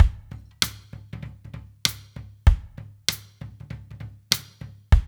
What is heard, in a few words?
DRUMS; East West